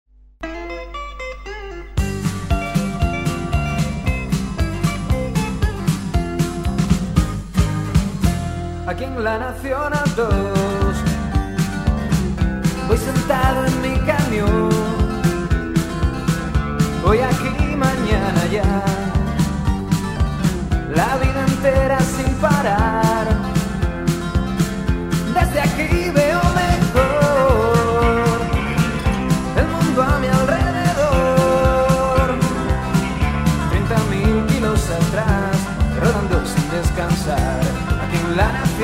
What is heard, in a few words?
blues